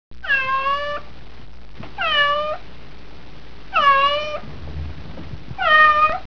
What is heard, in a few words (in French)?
miaou; Reperages 94; jeuvocab